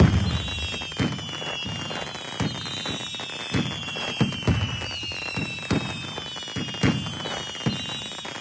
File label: big win loop; wheel